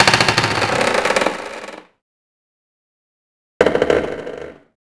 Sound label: dock CREAK; The Elder Scrolls III Morrowind GOTY; XBOX